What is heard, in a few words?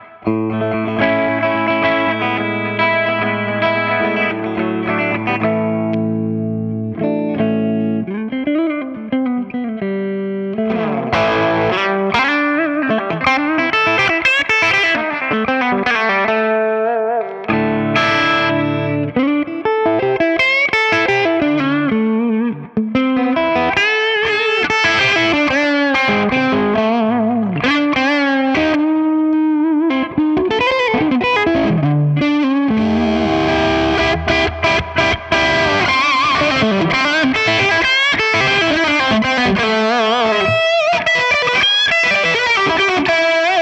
les paul; jmp